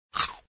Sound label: chomp